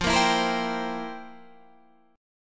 F Major 7th Suspended 2nd Suspended 4th Guitar Half Step Down